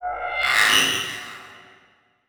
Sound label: Transition Feedback Sweep